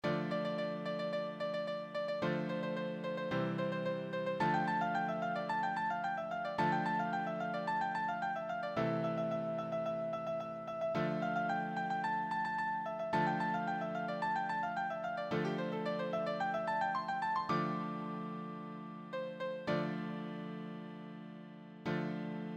easy solo, piano

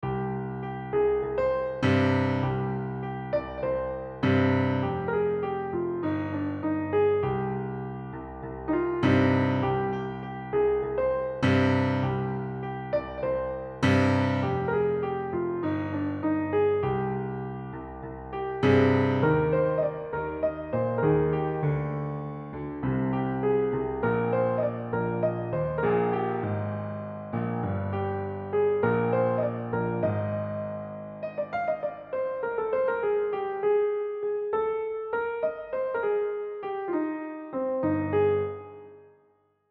Piano Solo; Alaipayuthey

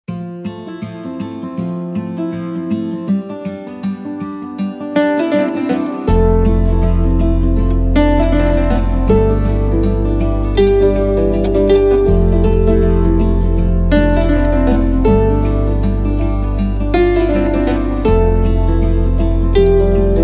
backing track

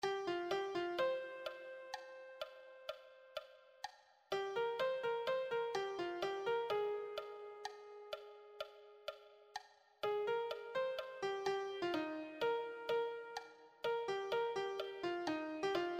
melody

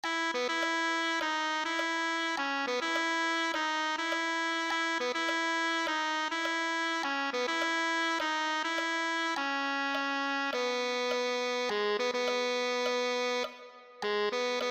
tenor saxophone

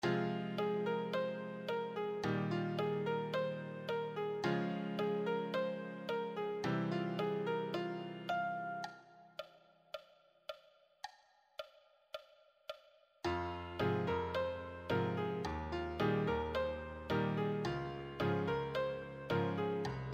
advanced solo

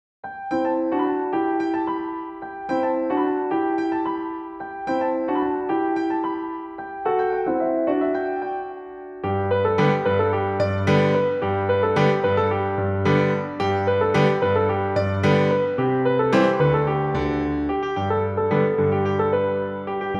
Saranga Dariya solo, piano; LoveStory

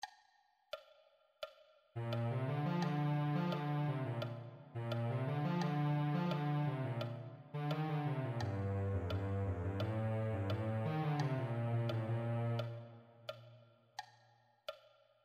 cello

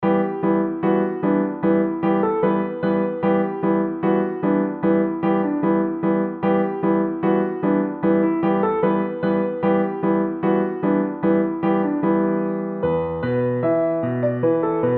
solo, piano

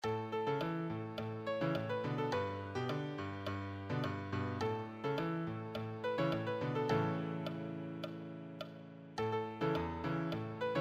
solo, piano

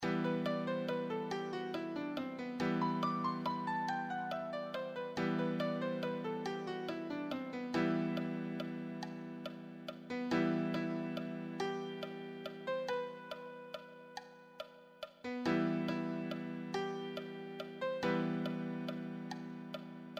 easy solo, piano

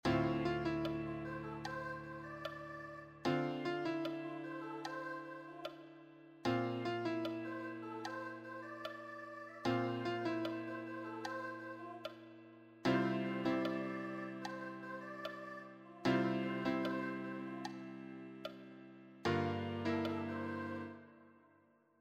accompaniment, piano

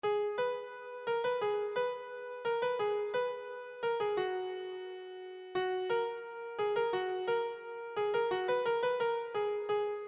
lead sheet, piano

